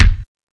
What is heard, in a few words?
BD1 M1, DRUMS; East West; Global Sounds